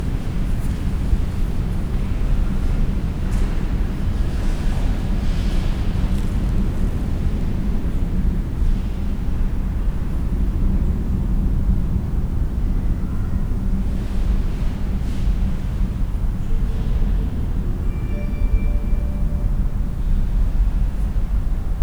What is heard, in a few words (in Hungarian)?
katedrálisban nész ektus szentes külső zaj